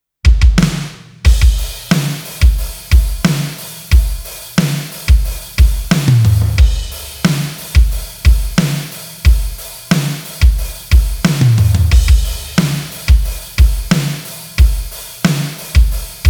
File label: NEW COUNTRY DRUMS ONLY